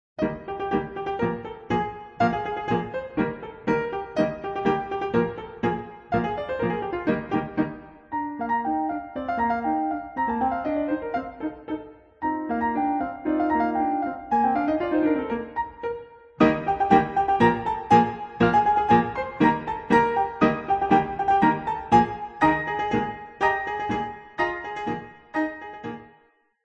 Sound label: Polka; Villageoise IV